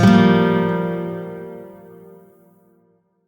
Shawzin Blues Chord F; Music; Warframe; Lotus Shawzin

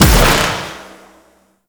Shot, Weapons, Sentient; Warframe; Shedu

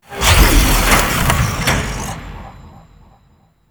Cursed Chest Unlock; Warframe; Duviri Murmur; Cave Combat Encounter

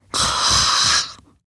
Snores, Grandmother, Vocalizations; Warframe; Deimos

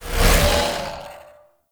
Beam Stop; Vampyric Liminus; Warframe; Entrati; Conquest

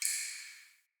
Cave Drip; Caves; Glades Of Andurin

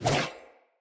Sword Whoosh R to L; Weapons; Player; Swords; Fey Sword

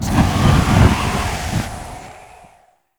Balloon Move Start; Warframe; The New War; Vehicles; Unum Tower Balloon

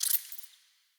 Tiny Creature; Ambience; Under City

